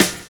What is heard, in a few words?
SERIAL BD; Univers Sons